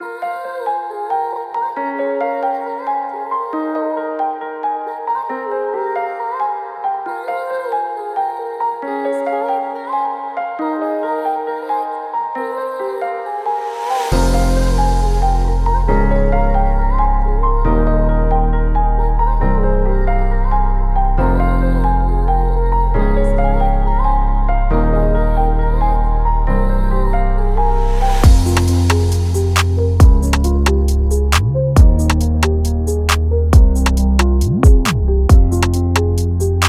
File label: cold beat